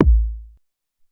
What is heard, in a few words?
Ele Kik, Alesis SR-18